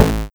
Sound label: Kick Dist Kick Short; Synthie V1